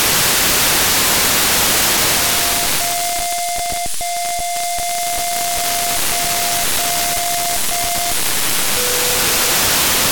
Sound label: satellite transmission; je9pel; endurosat one